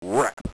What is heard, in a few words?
rap